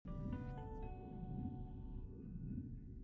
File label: Jingle